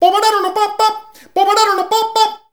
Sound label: "bubu", shouts; Best Service; Hyper Dance